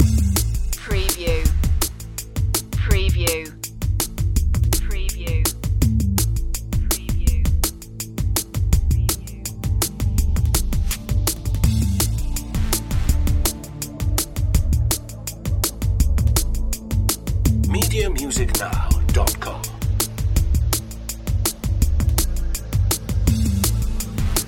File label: subtunnel loop